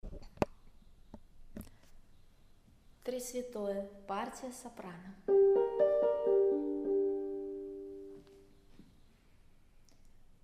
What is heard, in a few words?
soprano; Trisvjatoe